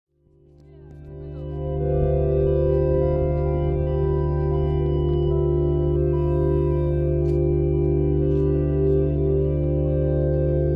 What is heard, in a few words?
strange musical sounds in milton keynes; ray lee's chorus